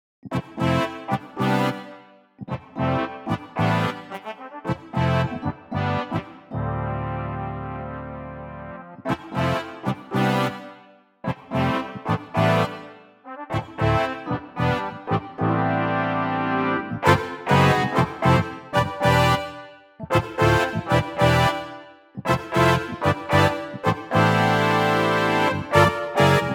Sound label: Horns